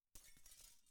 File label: glass